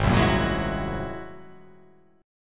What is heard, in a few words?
G sharp Augmented 9th G sharp